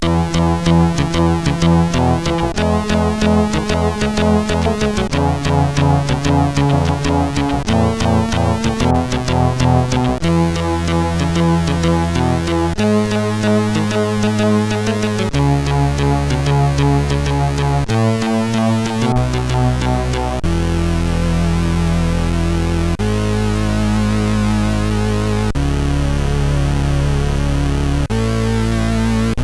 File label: Intro Tune Two, CSG8580R5; hvsc